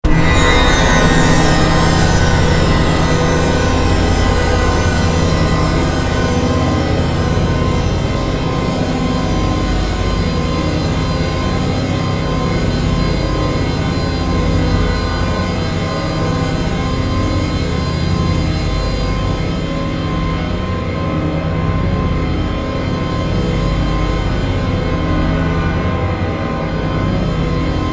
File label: Drone Synth Chimes, Drone, Synth, Chimes, Spooky, Horror; 5.1